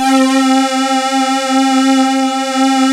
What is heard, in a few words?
SYNTHEX, SYNTH; East West - Global Sounds; CEE SYNTH